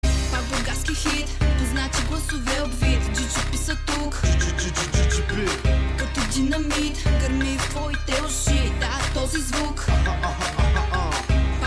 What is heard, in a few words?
win, music